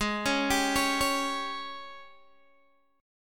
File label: Ab Major 7th Suspended 4th Ab Guitar DADGAD; 18,16,17,18,16,x; down